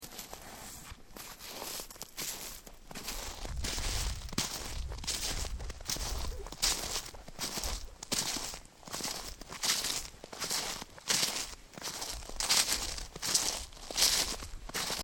marcher dans le bois

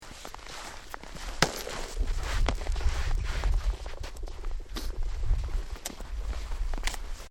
Lac qui craque

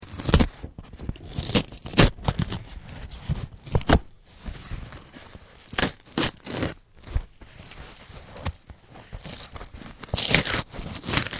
arranger une peau

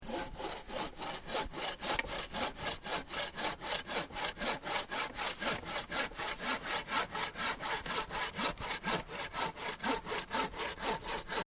scie

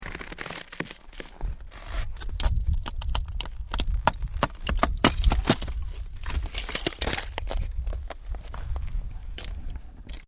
casser la glace en marchant

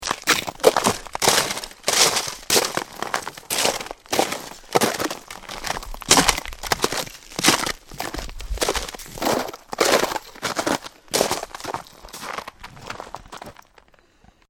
marcher en cassant glace

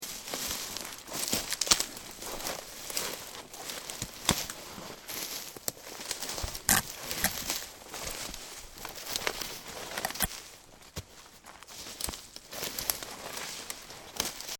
marcher dans les branches

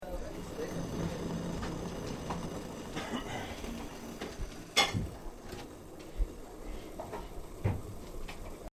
faire le the

